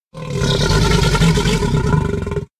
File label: sarco vox mumble